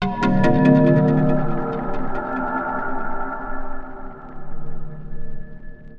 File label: D+B 160; eJay